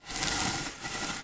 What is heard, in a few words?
Industry Tool Rope Movements Through Hole